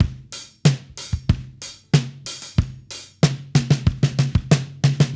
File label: drums fill, analog funk; Mixed Berry Jam; Sonic Foundry (Sony Creative Software) - What it Is! 70s Analog Funk